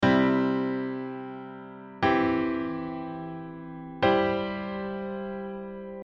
onchord

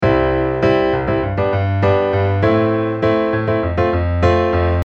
shake beat

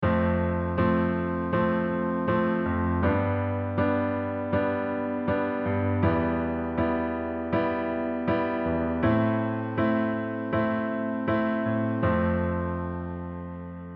comping

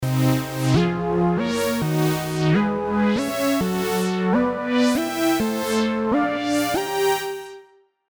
Chord Lead solo

